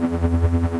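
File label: railcanon charge loop; weapons; cstrike; bb weapons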